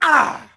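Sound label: Axeman Hit Vox; Civ4 warlords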